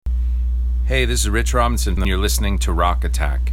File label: JINGLE